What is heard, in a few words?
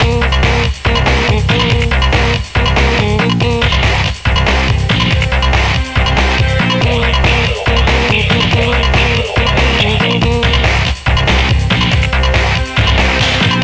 FastDance